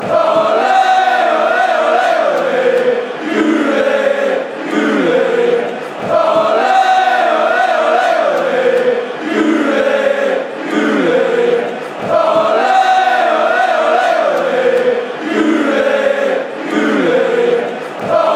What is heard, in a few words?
guly chant